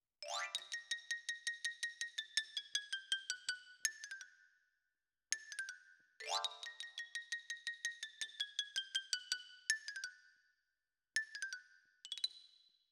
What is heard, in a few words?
Xylo Close